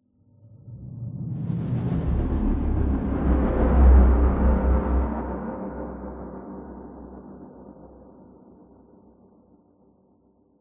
horror accent slow airy dark groaning whoosh drone